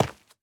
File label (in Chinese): 滴水石 破坏声